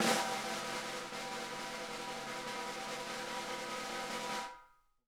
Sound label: ROLL, SNARE; Sonic Reality; Interactive Drum Kits